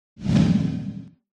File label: Zoom In And Out - Sound Effect